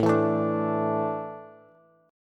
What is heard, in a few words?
A Suspended 4th, Bass; Asus4 strummed; JGuitar